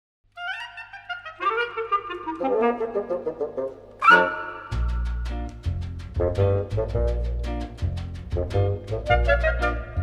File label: Woodwind Sextet